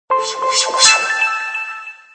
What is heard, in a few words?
NOTIFICATION